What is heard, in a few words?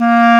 clarinet A#3; East West; Global Sounds